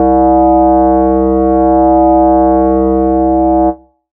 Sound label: syn c, Chillout Synth Atmos